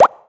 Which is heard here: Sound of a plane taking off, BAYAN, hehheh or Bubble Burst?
Bubble Burst